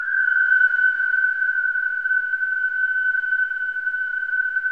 WHIS, whistling; Spectrasonics; Symphony of Voices

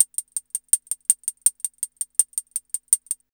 HH LP, Hip Hop R n B; USB Soundscan vol.19; AKAI

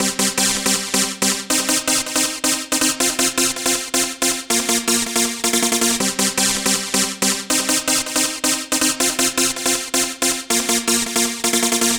Dance Synths, Hardcore Loops, Synth Loops

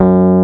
RHODES F#1; AKAI S-Series CD-ROM Sound Library VOL-2

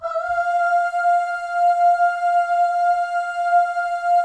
AHS, FEM; Spectrasonics; FEM.2 AHS

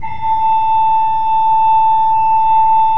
KOPPELFLUTE A4, Cathedral Organ; Propeller Island

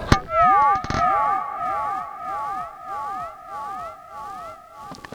SPACEY R, Funk Guitar; Zero G - Funk Guitar

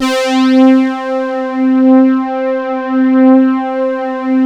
flat pad; Sound Gallery - Charged

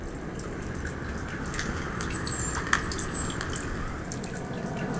rain; RSS RAIN

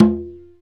PRC CONGA, Roland

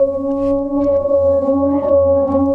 Void Perc; The Void; Processed Drumkits

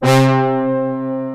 VOY8 BRASS, VOYETRA 8; Greytsounds VOL-1

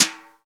SNR XBRUSH, Snr Brushes; Brush&Rolls

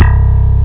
slap; jazz jackrabbit 2; M8

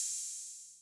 Hats Open Max Decay, 808

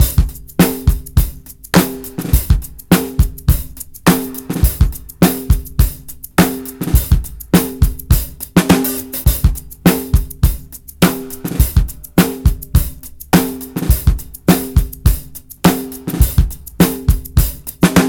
104 bpm; 90 s Sample CDs; Planet of the Breaks

